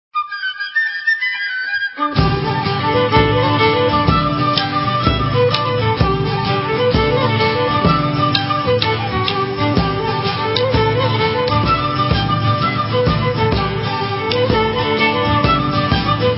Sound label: Celtic Christmas Medley; Viva La Musica